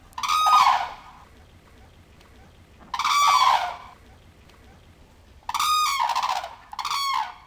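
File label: red-crowned crane voice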